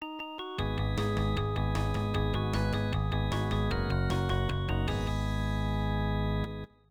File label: WINNING, electone; Great Sluggers 94 New World Stadium; namco nb 1; arcade